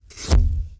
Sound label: ball kik; The Interactive Alphabet